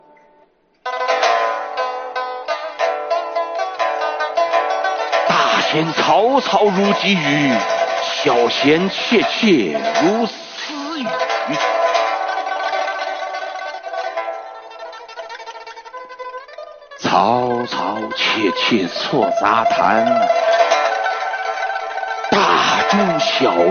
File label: pipa music